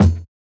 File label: Kick, jungle breaks drum sounds; ORIGINAL JUNGLE BREAKS